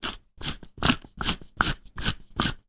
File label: food large pepper mill turn grind